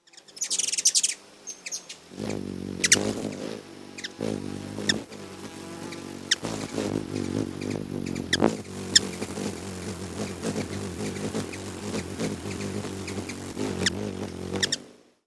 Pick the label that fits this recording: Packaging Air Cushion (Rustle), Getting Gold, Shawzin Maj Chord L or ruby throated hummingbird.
ruby throated hummingbird